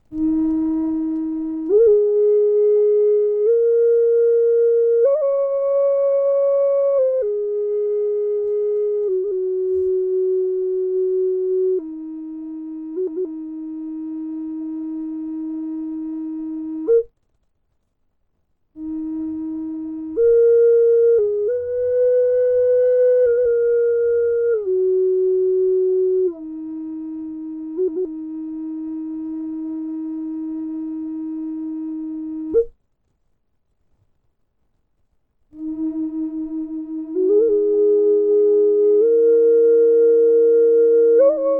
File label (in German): Drone Flute Doppelfloete E 432 Ahorn ohne hall; e 432hz; indianerfloete